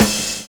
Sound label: CRASH FIL; Univers Sons